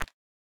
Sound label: fall; YummiCookie; French